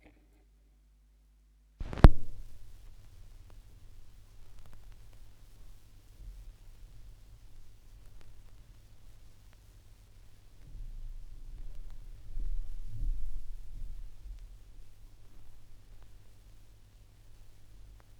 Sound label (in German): schallplattengeraeusche, Vinyl; wendelrekords 4 07 A